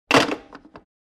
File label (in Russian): phone